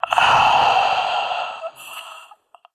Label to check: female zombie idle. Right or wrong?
Right